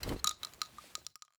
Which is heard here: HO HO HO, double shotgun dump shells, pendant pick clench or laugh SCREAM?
double shotgun dump shells